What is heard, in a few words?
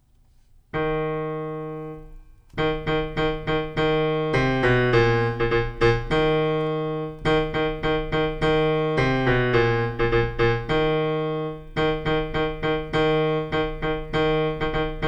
bass only